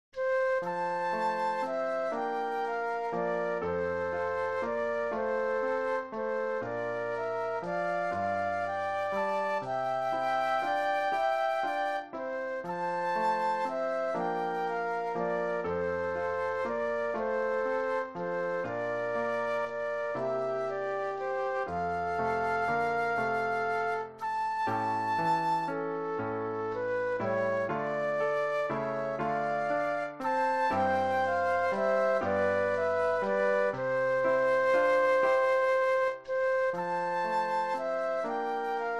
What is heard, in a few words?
Flute Duet and Piano; christmas